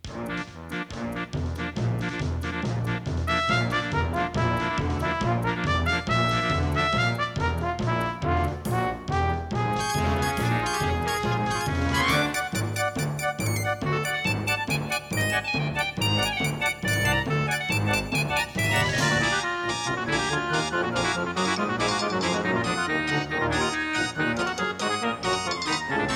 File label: AWE32; Day of the Tentacle Opening